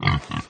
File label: mount; sfgame; mounts